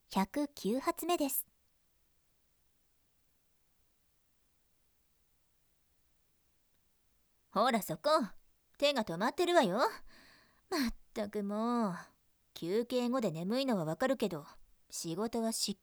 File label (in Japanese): 放屁実演音声